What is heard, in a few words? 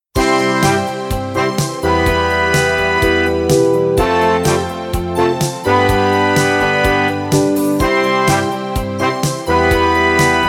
Life Is Wonderful with vocals; snow white